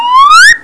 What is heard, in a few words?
floop; State-Smart